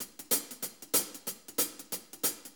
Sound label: Shuffle HiHats; Sonic Foundry (Sony Creative Software) - Loops & Samples Drum Tools; Shuffles